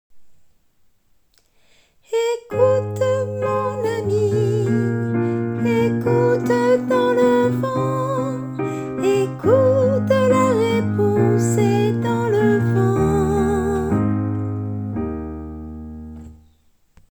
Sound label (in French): tenor